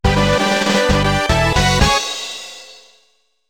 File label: Battle Race Start; Snowboard Kids